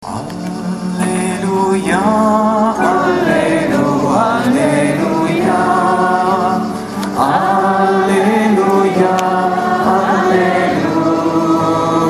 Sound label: aleluja